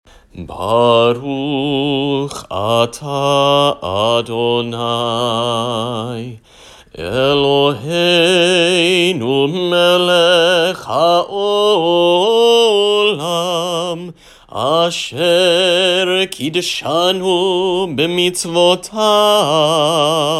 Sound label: Candles Yom Tov Sung